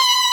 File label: violin; osu